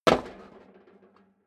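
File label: step boots metal rattle jump, footsteps; schritte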